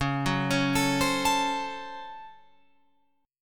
C sharp Major 7th Suspended 4th Sharp 5th C sharp, Guitar Open F; arpeggiated; 13,13,13,16,15,16